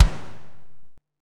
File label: KOMMERZDRUMS